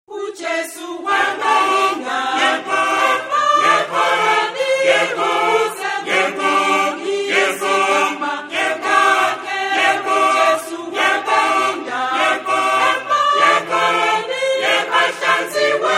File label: choir; ksb choir